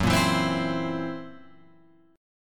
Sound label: F sharp 7th Flat 5th F sharp down Guitar Open F; strummed; JGuitar